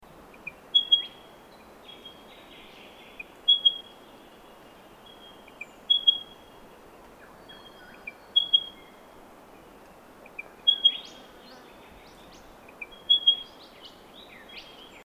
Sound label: bird unknown warburton sequence